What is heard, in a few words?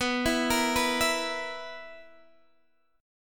B Major 7th Suspended 4th B down Guitar Open D